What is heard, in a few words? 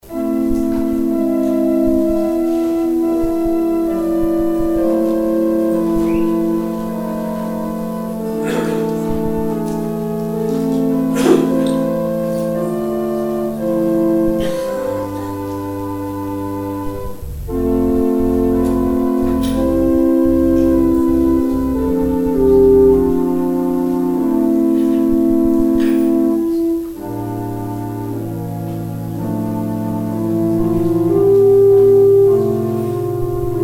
organ interlude; played during the offering